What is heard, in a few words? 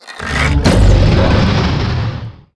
Bite Arrow